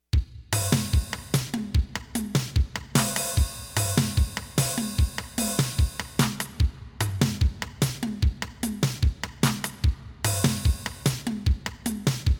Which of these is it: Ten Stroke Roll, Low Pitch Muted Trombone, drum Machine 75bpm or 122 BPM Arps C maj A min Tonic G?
drum Machine 75bpm